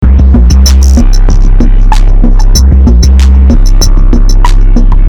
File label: Loop